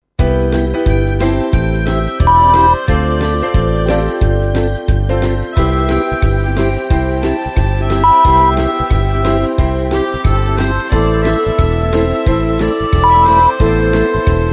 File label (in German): XMas Swing Mundharm; Mundharmonika; unbeschwert